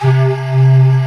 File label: LINEAR SYNTH